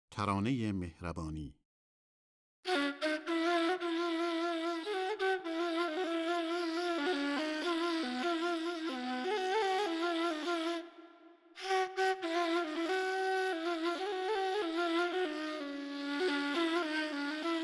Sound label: Taraneye Mehrabani Bayat-e Tork Radif-e Ney; Avaz-e Bayat-e Tork